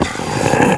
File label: brain idle; openffa